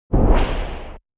Whoosh; Whooshing sound for video editing, movements, logos, flash, or animation; Sound Design

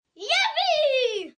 Cheer Yippee, 1 boy, 6 yrs, Yelling; VoiceBox

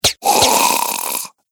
Pistol Shoots Silver Bullets Into Vampire; Battle Sound Effects

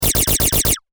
Science Fiction Laser Machine Gun Long Burst; Lasers

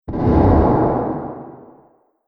Horror Explosive Impact

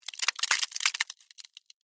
Ice Crack Movement, Scifi; Science, Fiction

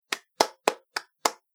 Five Hand Claps; human; body; Body Sound Effects; Andrew Fleming

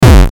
Hit Dirty Distortion, Hit Dirty Crunchy Bass; Blastwave

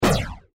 Science Fiction Laser Gun Single Shot; Lasers; SFX Bible

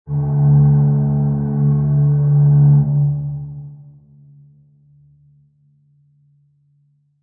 Fog Horn Ship Large Medium; Boats; Vehicles; Lynne Music

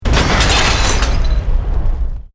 EXPLOSION MEDIUM METAL GLASS

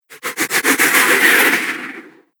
Ghostly Mover, horror sound effect; Ghost Sound Effects

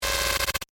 Static Short, Production, Element, Static, Short; Sound Design; BlastWave

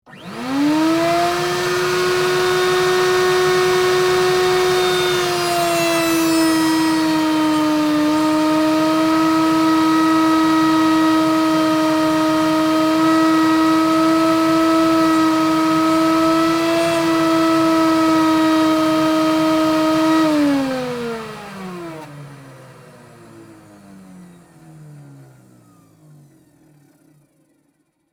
Router Into Wood, 2X4; Hand Tools (Sound Effects:Tools); BlastWave

